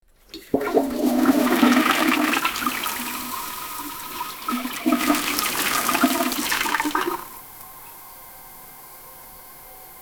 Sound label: flush the high tech japan, bathroom